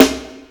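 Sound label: snare, drums; mercure drums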